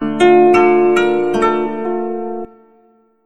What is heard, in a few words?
KOTO LOOP; USB Soundscan vol.60; Asian Traditions; AKAI